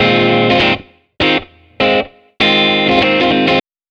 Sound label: loop; Loop Construction In Sonar 4